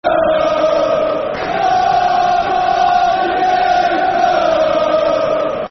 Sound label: Come On You Pool [Football Chant (Soccer Song)]; Blackpool Bfc